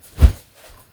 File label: Bat Swoosh